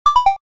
button

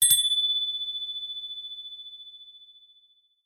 small bell

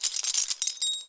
keys

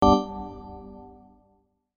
button

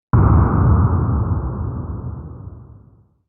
drum

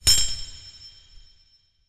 metal

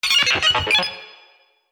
robot

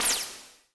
beam gun

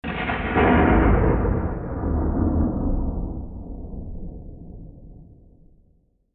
thunder

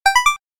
button

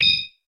button

